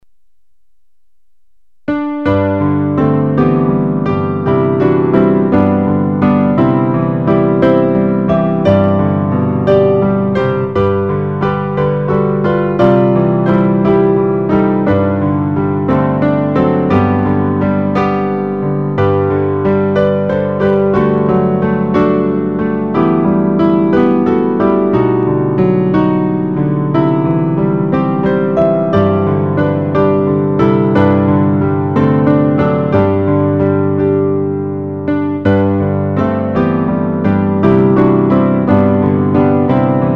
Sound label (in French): piano